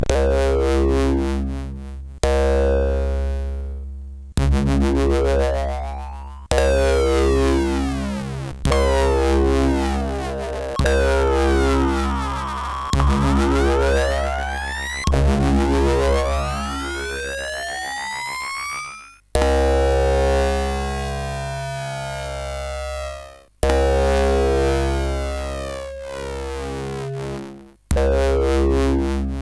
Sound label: Synth Samples Sync, CSG8580R5 sid